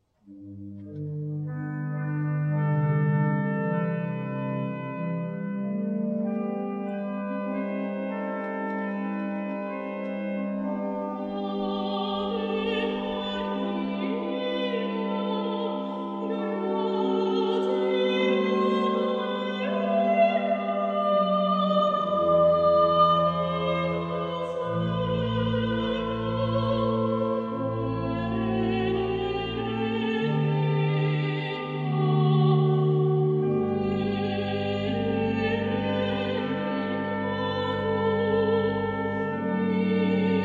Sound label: AVE VOCAL; svatby